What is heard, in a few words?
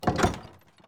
object chest open